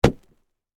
Carpet Roll Drop, Foley, Carpet, Roll, Drop, On, Cement; Drops; Sound Effects:Objects